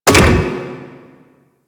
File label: Robot Repair Stop; Ambience; Props; Warframe; Corpus Gas City